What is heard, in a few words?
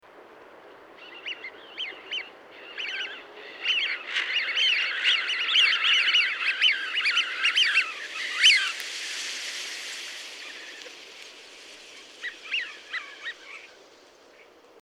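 eurasian wigeon flight flight call wing sound; l luppewehr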